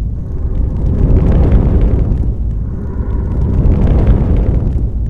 fire idle, poltergeist

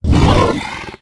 flesh attack, monsters

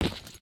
step wood

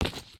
step wood

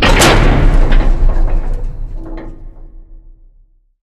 airtight gates stop; device

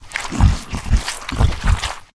boar eat

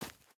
step grass

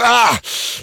pain

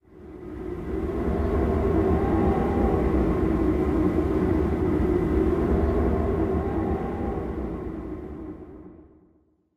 wind, nature

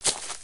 grass, human step

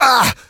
hit

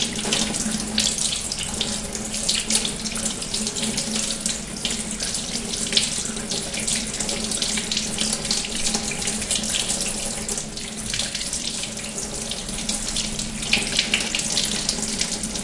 waterflow, ambient

